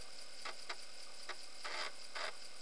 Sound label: Search Skip Search; activegs ios